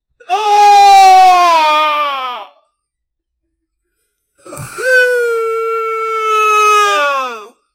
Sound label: this of a male falling